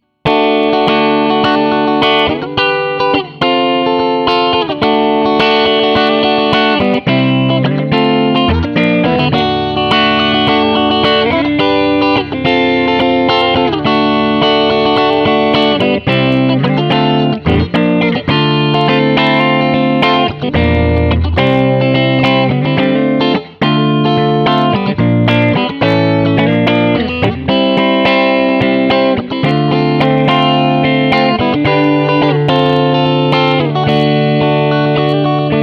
clean compressed

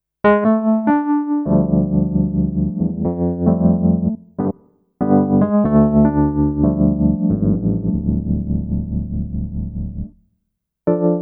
Kawai ES120 E-Piano

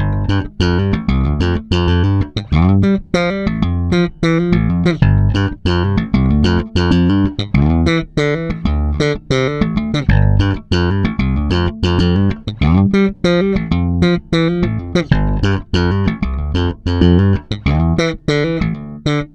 slap

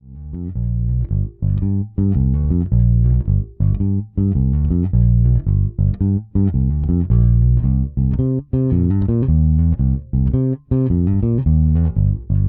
Fender Little Overdrive

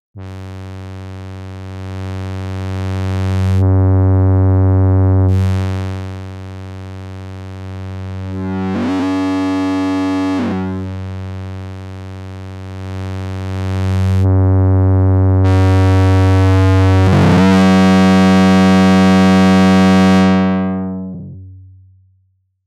Drive FB 2600

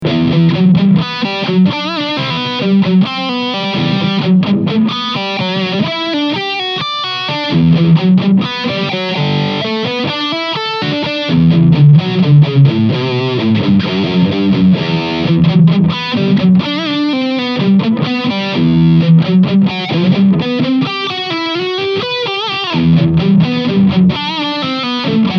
Blackstar Silverline Special OD2 KT88

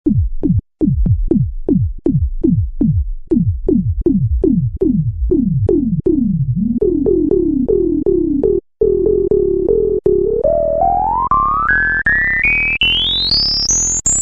Voyager Filter

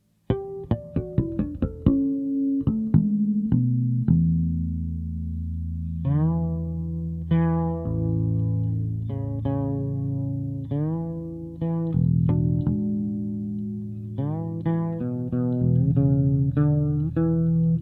Fretless; NUX

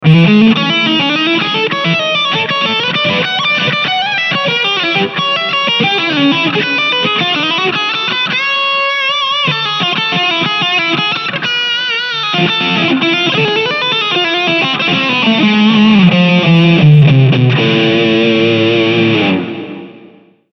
Preset JVM Drive

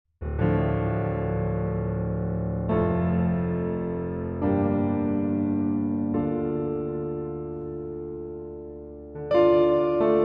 Gyraf GXXIV Piano Dry

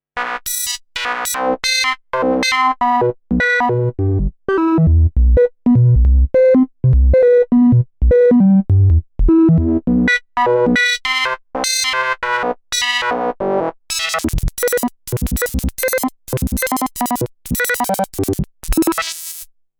Ringmod + BP

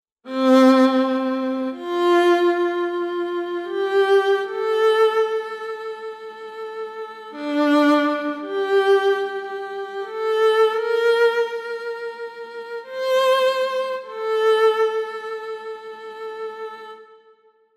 Emotional Viola Fp Decrescendo

